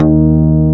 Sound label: BASS; SoundFactory